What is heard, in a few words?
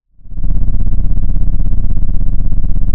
Sound of an engine revving